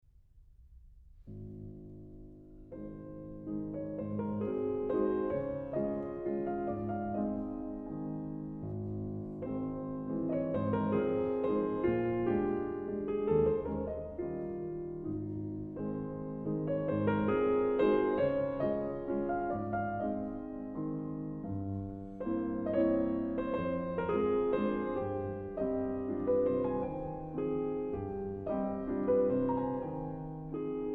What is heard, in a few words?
Mazurka Op 59 No 2 in A Flat